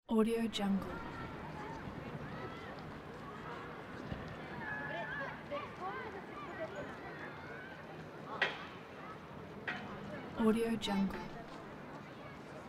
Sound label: kids city playground